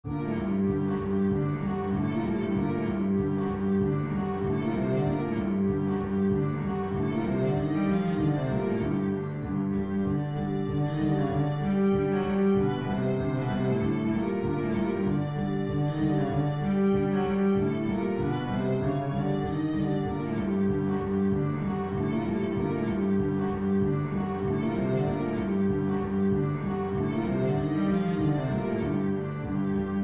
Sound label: nahawand, cello